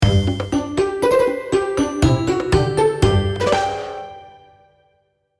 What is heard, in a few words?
jingle